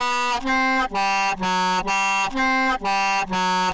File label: horns, jazz loops